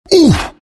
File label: bristle pain; bristleback